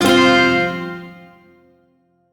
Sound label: Shawzin Chromatic Chord I; Music; Warframe; Duviri; Chords